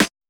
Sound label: Snare Hit